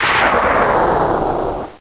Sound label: Thunder Punch